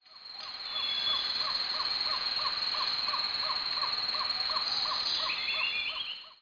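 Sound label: jungle ambience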